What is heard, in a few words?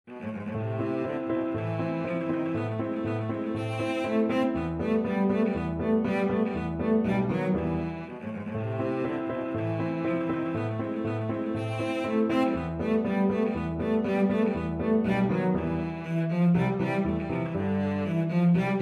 cello